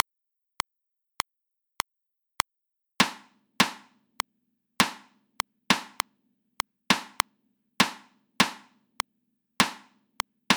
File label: reversed rhythms at 100 bpm